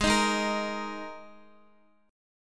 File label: G sharp Suspended 2nd Guitar chord, down; strummed; 4E,4A,5D,5G,5C,6F; G#sus2